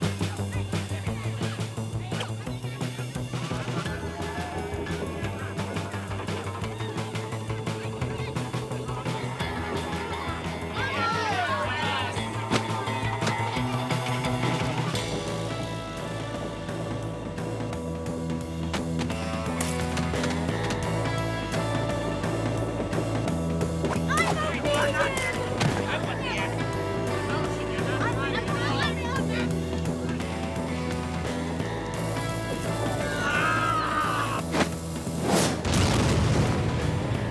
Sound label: Theme E (fade from drums and bass) ~ D; Boomtown; mcsm music; Netflix